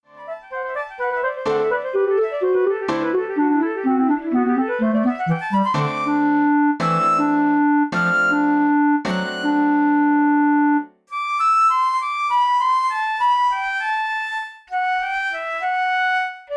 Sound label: Under Sail for Flute Clarinet and Piano